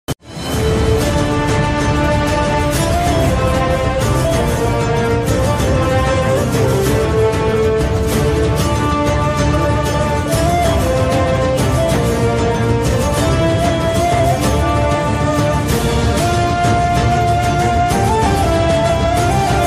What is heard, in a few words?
trap audio; kurulus osman